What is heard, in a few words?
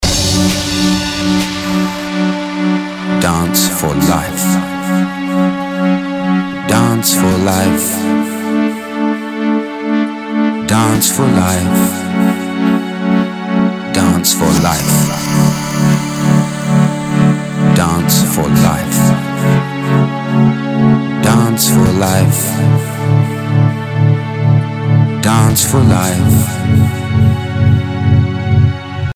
String